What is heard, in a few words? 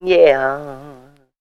yeah, sung